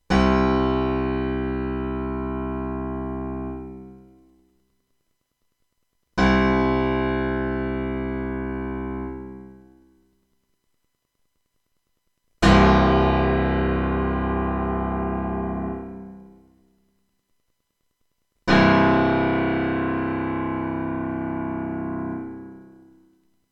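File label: string length test, piano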